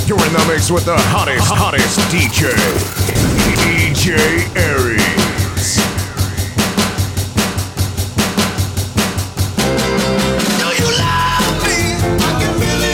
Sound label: Rock 'N' Roll & Soul Retro Mix; Clean